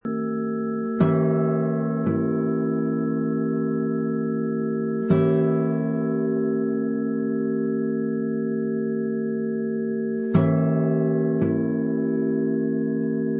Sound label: Chords